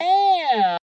formant filter vox hit, synthesized voice

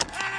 motorfunk, electronic hits

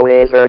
wizard; VIDEO GAMES; wow

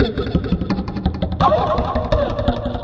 ouch echo, drum loops and breaks, 169 bpm; 161 to 180 bpm

